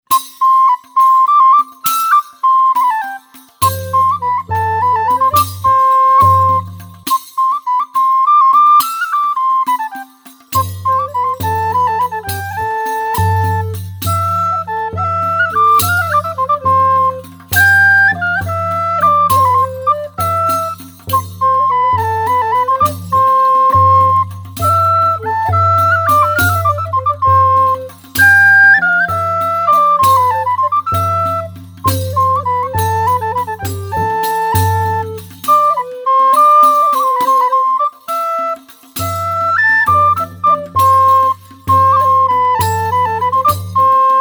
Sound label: Estampie; Sanctuary 5th Estampie